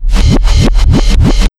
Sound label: RUN 140 FX